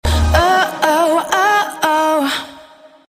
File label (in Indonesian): ringtones lucu ringtone gokil ringtone aneh